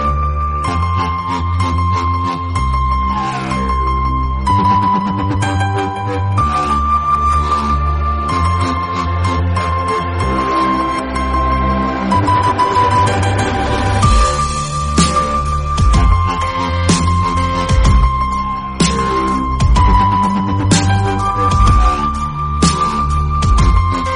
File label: electro lights samsung